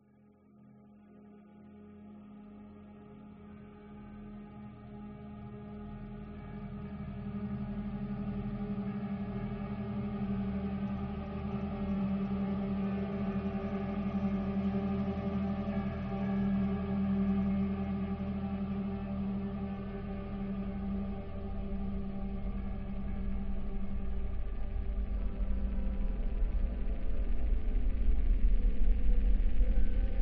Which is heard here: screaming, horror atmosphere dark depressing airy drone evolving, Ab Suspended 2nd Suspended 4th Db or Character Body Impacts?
horror atmosphere dark depressing airy drone evolving